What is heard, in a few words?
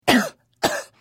cough